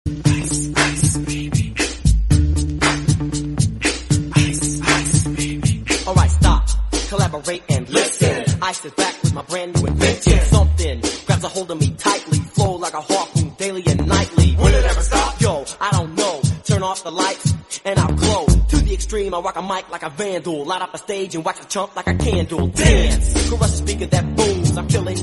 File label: english song